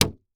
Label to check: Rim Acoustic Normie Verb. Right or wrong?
Wrong